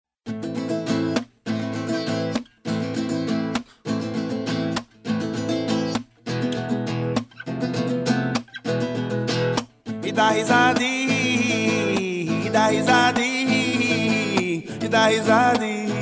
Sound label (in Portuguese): Risada